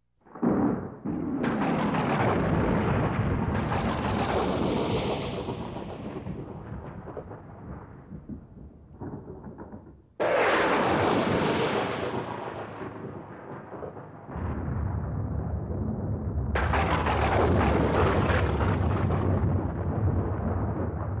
wild thunderstorm

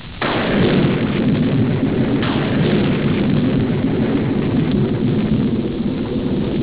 Thunder Clap

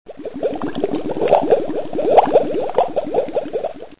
bubbling cauldron

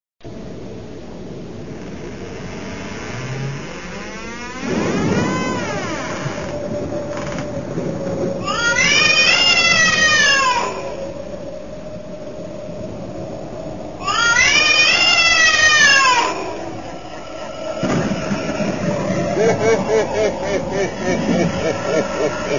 Door Creak, Cat Screetch, Evil Laugh Mix

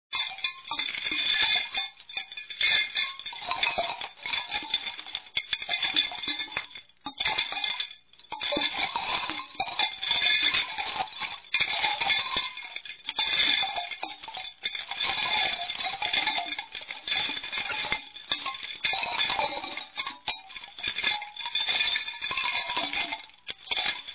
Chain Rattling

